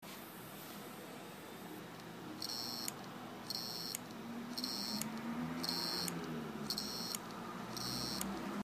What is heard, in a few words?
Seagate heads, damaged hdd sounds